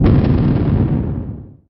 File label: explosion; DeadlyRace